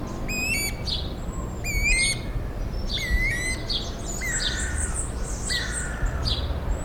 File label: Guira guira song